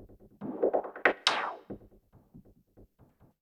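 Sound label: SNARE ELECTRO 140, Techno Drum; Techno2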